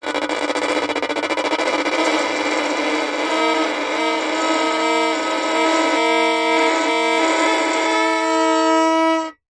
musical violin bowed scrape badly source for horror sound design